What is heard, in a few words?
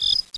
night bug; drkclny